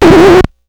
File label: Needle Drag, Vinyl Record Samples